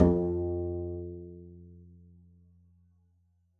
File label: pizz f2, double bass; dsmolken